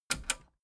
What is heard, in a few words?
metal button radio click disable fast heavy